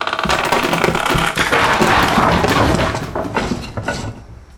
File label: collapsing bookshelf